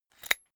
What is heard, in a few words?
umbrella telescopic extend fast